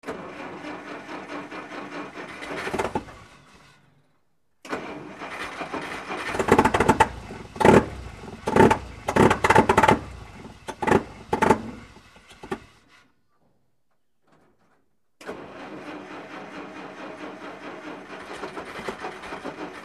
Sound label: Dodge Fire Trk Rough S, fire truck